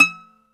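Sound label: Bouzouki E 4, Mediteranean Traditions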